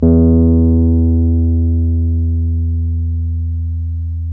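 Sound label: Wurlitzer electric piano E2; Keyboards of The 60's and 70's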